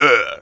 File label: Spade Guard Trumpy Dmg